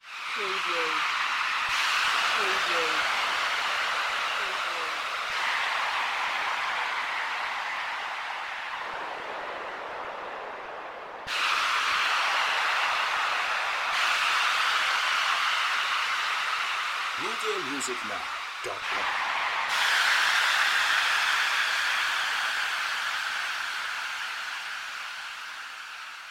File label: Hostile planet ambience